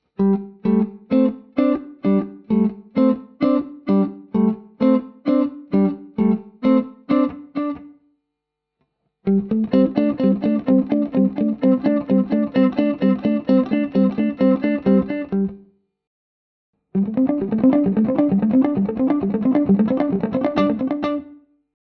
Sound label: delay